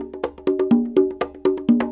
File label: PERC LOOP ETHNO 125